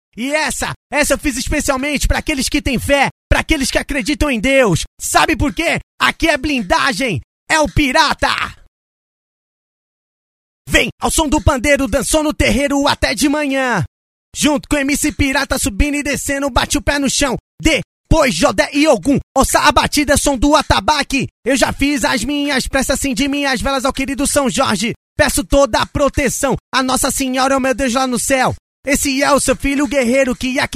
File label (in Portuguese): acapella